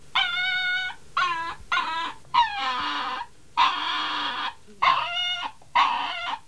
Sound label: Canis familiaris whine